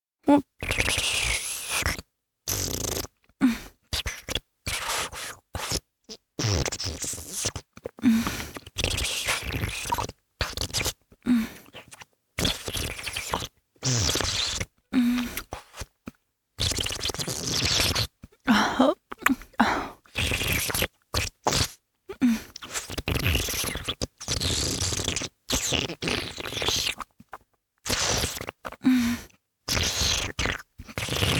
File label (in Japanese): お掃除フェラ(中):亀頭を咥えて残っている精液を吸い出す, チュパ音; チュパ音抜粋集